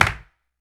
CLAP, Human Perc, Body Perc

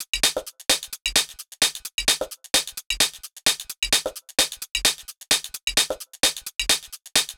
Hh Tr Loop 130; Equipped Music; Loungin House; Hh-Shake Loops 130

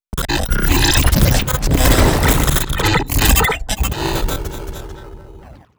glitch

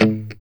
A, Strat pop, Guitars; Spectrasonics; Hans Zimmer Guitars VOL-1; JC-STRAT POP

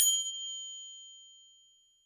Finger Cymbal; YAMAHA RM1X

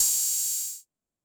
606 Open Hat, drum kit; Concrete Collage Drum Kit; Concrete-606

